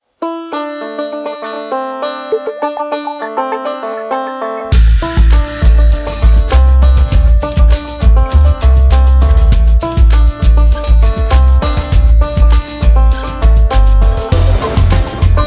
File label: Instrumental